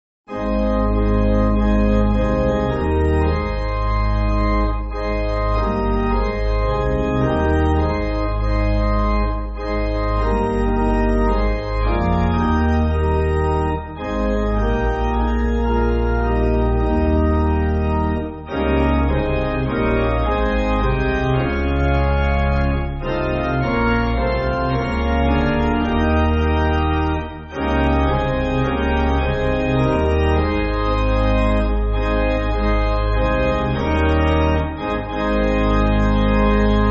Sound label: Pipe, church music; I Offer My Body